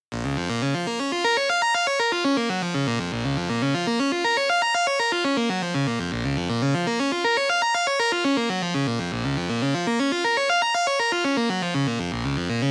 Red Fog Analog Hard; Get Even

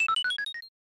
Unknown Jingle

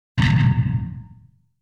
The sound of an iron ball hitting a wall; dondokodon

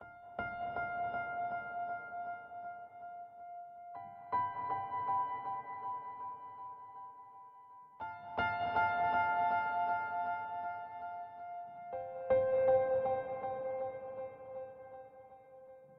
Piano; nova 111; ps vita

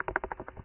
Running

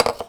bowl placed on table

